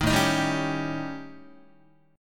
A 7th Flat 5th A down Guitar 4A,4E,5A,5D,5G sharp,6C sharp; A7b5 strummed; JGuitar